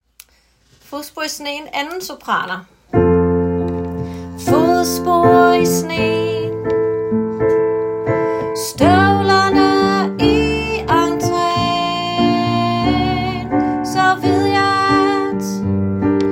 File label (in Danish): kor Sopran